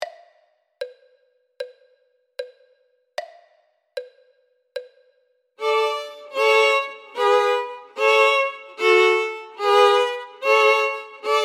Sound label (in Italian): violino I e II